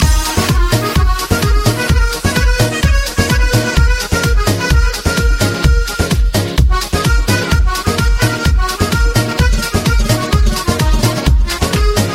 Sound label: Rumba